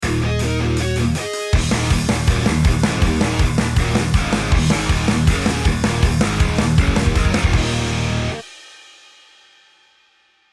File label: metalcore logo